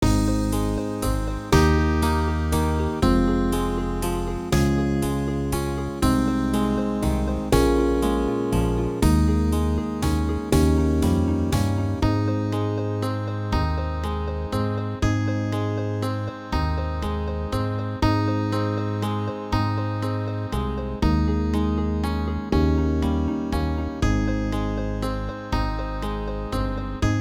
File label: Bb Lip Slurs